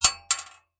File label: Bullet Shell Drop Metal; Lynne Music